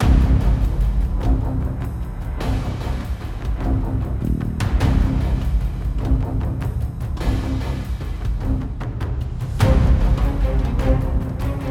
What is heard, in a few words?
Action Desperate Escape 100bpm High; project zomboid